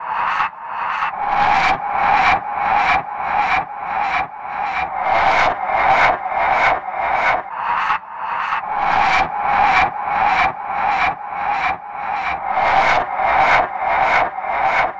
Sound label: FX 128 C3